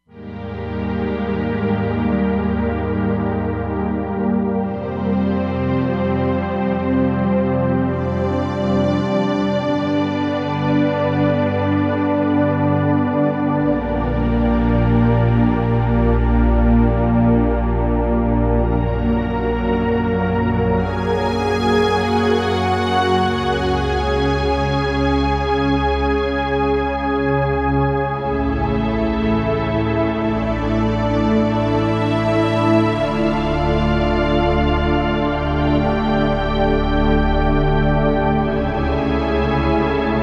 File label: Nu Epic Pad; Roland Fantom XR